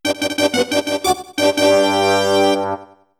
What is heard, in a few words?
Race Fanfare; super spamton 64